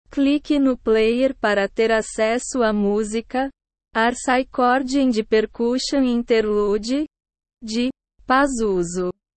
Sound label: harpsichord and percussion interlude